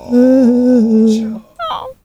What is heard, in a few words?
MONGOL V HI, Asian Traditions; USB Soundscan vol.60; AKAI